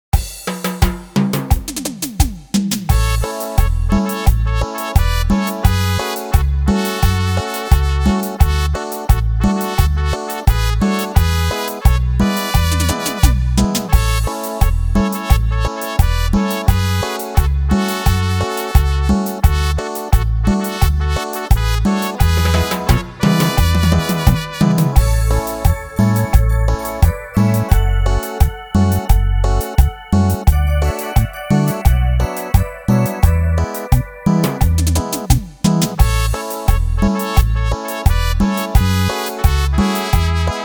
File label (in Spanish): Cumbia